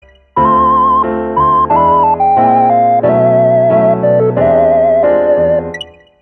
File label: Chord 7